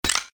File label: Spin Stop; The Lucky Fairy